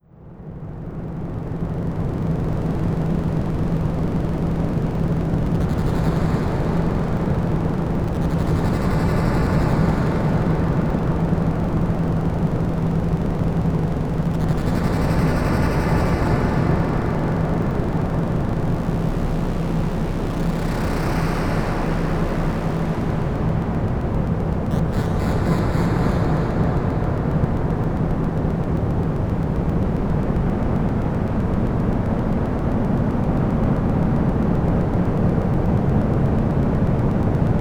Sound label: soundscape